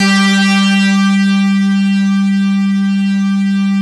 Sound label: CELLU G2, Yamaha VP-1; Music Store; 90's Sample CDs; CELLULOID